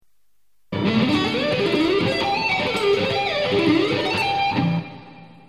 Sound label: sweep guitar